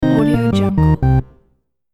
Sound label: circus lose